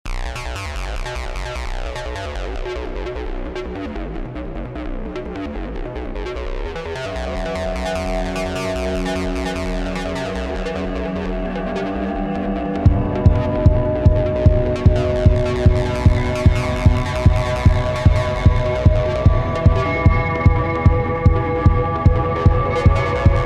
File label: Boss Music; Escape from Tethys